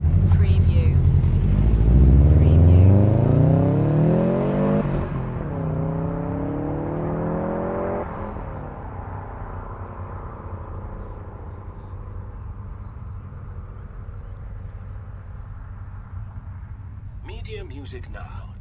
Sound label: Rally car distant drive off; beanstalk audio